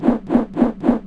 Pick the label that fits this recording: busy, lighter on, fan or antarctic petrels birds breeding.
fan